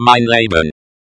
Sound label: death, player